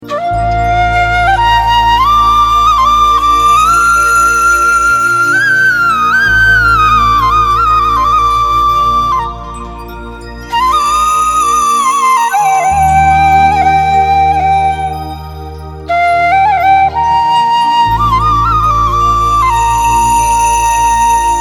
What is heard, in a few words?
Instrumental Flute